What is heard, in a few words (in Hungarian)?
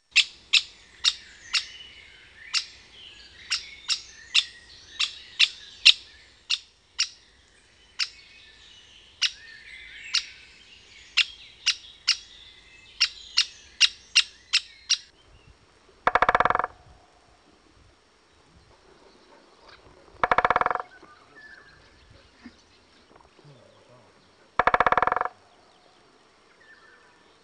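Nagy fakopáncs; Madarak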